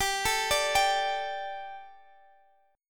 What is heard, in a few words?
G Suspended 2nd G down Guitar DADGAD